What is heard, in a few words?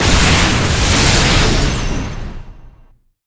Union Smash Hit